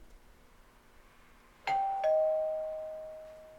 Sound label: Wired Ding Dong